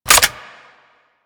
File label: Reload Dual Pistols; Grn Queen Guard Dual Pistols